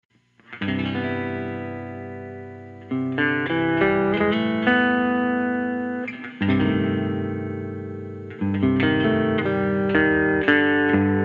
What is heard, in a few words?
dangelico new yorker teal